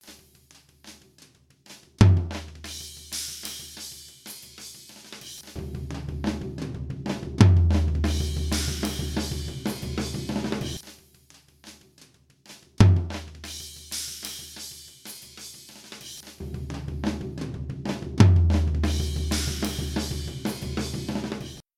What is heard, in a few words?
Hipass; MOOLADDER